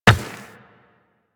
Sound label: Run Rock, Footsteps; DE; Warframe; Tenno